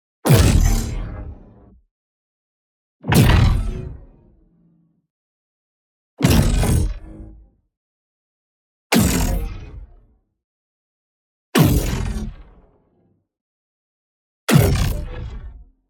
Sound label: IMPACT LARGE Corrupted Digital Armour; boom library; Matter